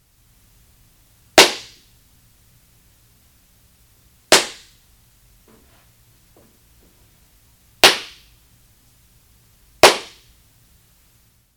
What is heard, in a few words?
library office